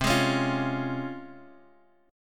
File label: Db Major C; Db/C; strummed